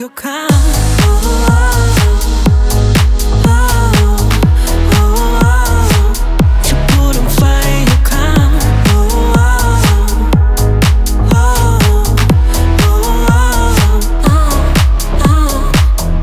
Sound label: Pop mixed